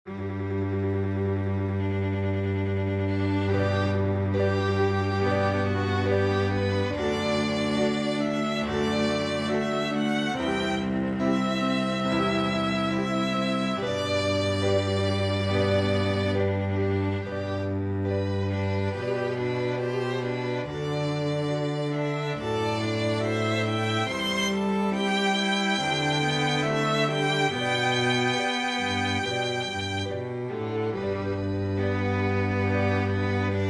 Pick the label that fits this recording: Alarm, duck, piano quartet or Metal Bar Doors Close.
piano quartet